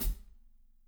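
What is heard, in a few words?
closed hi-hat, natural kit; Spectrasonics; Burning Grooves CD2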